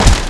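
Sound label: Wood Ship Hit; Civ4 warlords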